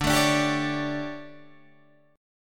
D Major 7th Suspended 4th, Guitar, Open G

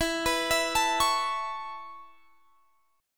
E Suspended 6th E

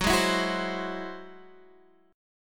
Ab Augmented 7th Gb, guitar

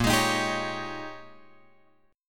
A sharp Minor 13th A sharp, Guitar chord; A#m13 Chord {6 X 6 8 8 9} strummed

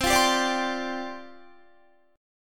C Suspended 2nd Suspended 4th, down, Guitar

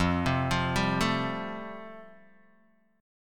F Major Add 9th, Guitar Open D

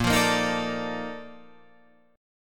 A sharp Major 11th A sharp

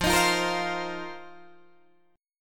F 7th Suspended 4th F, Guitar, DADGAD

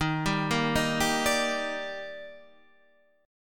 D sharp Major 7th, Guitar Half Step Down

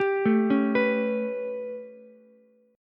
A 9th A, Ukulele; arpeggiated

